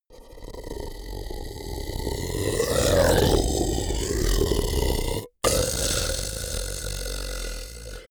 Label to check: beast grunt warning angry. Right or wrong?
Right